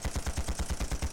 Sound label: rifle shot